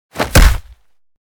right hook; battle

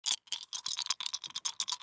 dice shake; squares

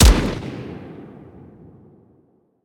sniper rifle; battle

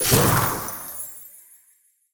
ice ball; battle

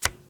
draw; crazyeights

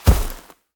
bear step; chaosbear

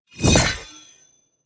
power drain; battle

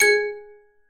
plus; rollingballs

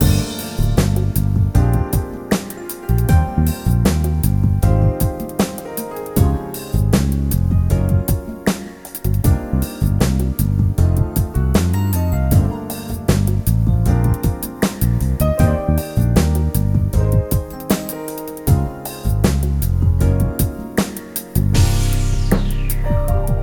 mus; 3cardpoker

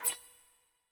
match dice, bunko

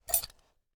move king, chess

